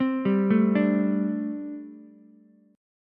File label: G Suspended 2nd Suspended 4th, Ukulele; Gsus2sus4 arpeggiated; JGuitar